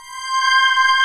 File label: LINEAR SYNTH